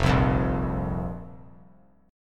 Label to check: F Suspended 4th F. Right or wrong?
Right